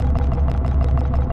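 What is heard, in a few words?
engine loop, spacecraft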